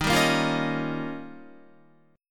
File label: D sharp 9th, Guitar; strummed; chordshape; 10,10,10,10,13,11; JGuitar